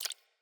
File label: Rat Water Step